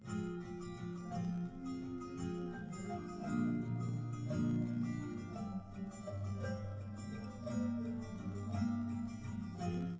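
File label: nodrums live recording 250ms 5cm, underdetermined; SiSEC11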